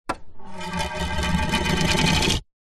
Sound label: household cd disc down and spin wood